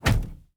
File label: car door trunk slammed